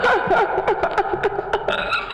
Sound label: Female Laughter